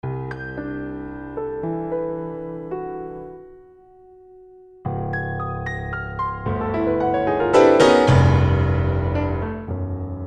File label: 12 tone music